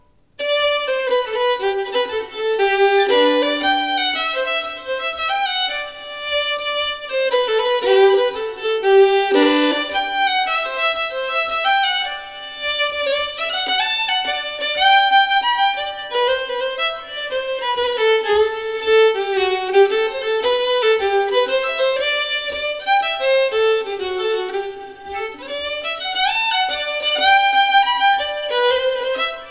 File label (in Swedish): Rodopolska